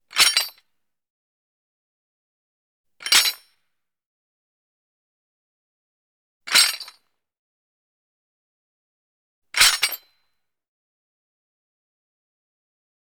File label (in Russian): Kapkan